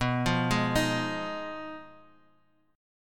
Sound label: B 6th Flat 5th B down, chord